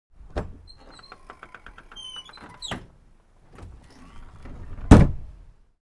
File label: Manual bus door open & close; Cars